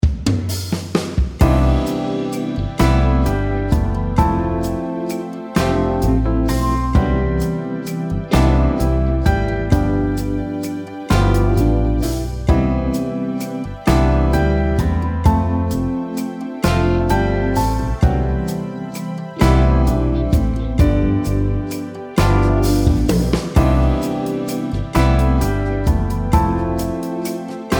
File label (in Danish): Akkordprogressioner C dur C instr m mel